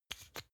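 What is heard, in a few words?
toothpaste open bright, Bathroom; haus-garten; badezimmer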